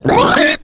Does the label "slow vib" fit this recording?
No